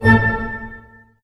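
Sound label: staccato A pianissimo, orchestra; Sound On Sound - Peter Siedleczek's Orchestra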